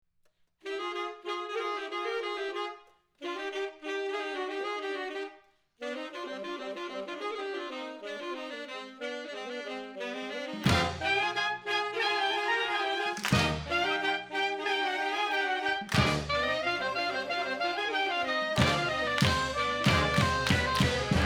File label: Fanfare